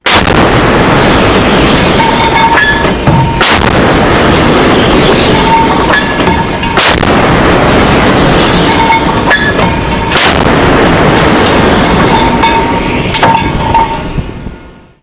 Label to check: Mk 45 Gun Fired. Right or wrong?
Right